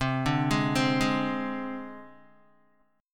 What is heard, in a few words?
C Suspended 2nd Sharp 5th C Guitar; arpeggiated; 4F,4A,5C,5G,5C,6E; 7,5,8,5,8,x; JGuitar